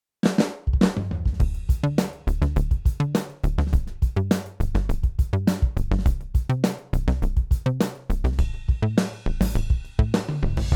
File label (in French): mix avec; flywheel